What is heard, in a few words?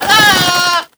fire, weapons; serioussam2; clawdovic